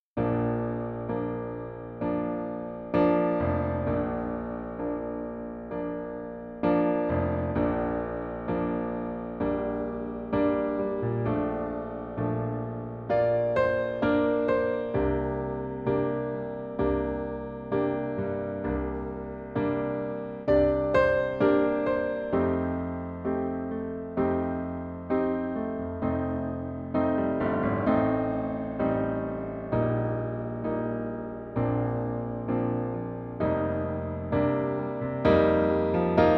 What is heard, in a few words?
piano